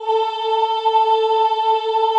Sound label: CHO A3, CHO Ooh; Sound & Vision; Gigapack I CD 2